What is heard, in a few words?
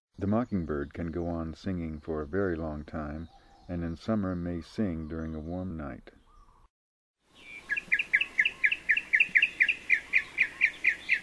Mockingbird; Voices Kauai